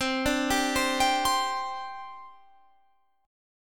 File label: G Suspended 4th C